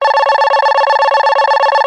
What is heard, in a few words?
science fiction alarm console beeps warning; zapsplat